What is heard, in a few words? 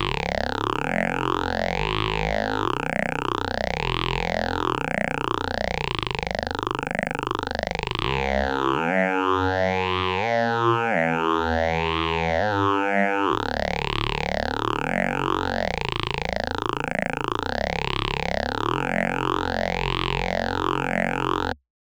micromoog test